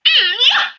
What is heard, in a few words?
vox attack mmm yoh